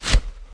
Footsteps; SFX Character

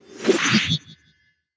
SFX Pack Open Start; mobile

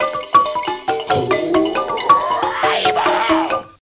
Tribal dance; Worms Armageddon

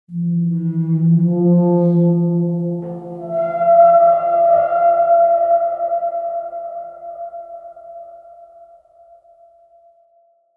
Tone Organ; The Last of Us; Tone Organ Explore Match Intro

